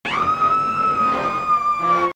screaming lady

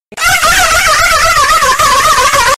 dolphin laugh vibrato; flightreacts

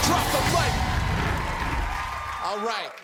drop mic

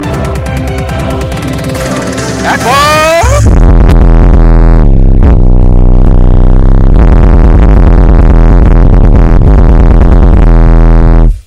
walking bass

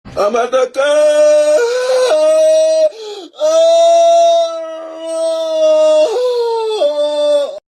ooo; ambatukam

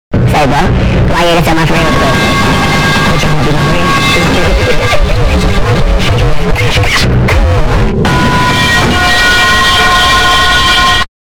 hydroflask falling bass

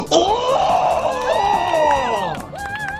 war cry

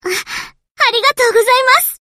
donate sound

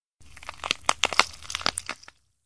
crunch

